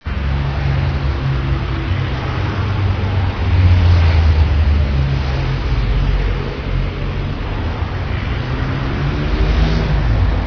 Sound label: heavy traffic